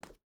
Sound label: footstep barefoot wood walk; Rust